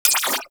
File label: Revolver Pistol Shot; Prime Revolver